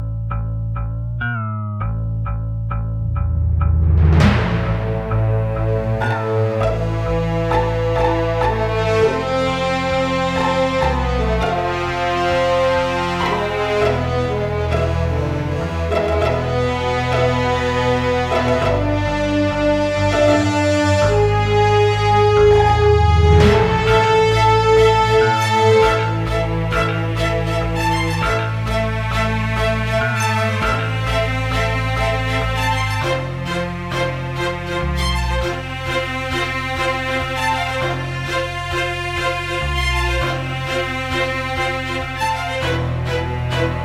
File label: TENSION